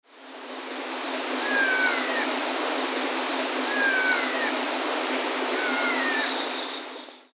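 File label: Spotted Nutcracker; hoshigarasu; norikura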